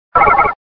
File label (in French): Cri